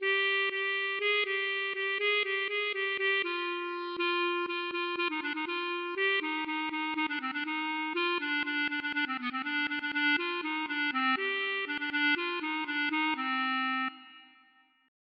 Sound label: klezmer